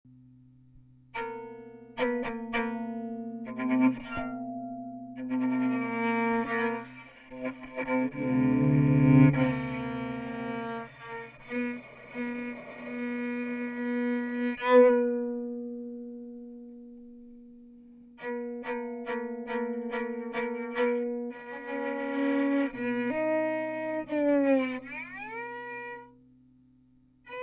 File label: preamped violin